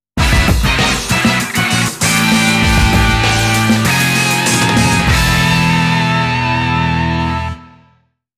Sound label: Game Over; The King of Fighters; iphone